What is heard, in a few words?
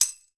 TAMBOURIN, Percussion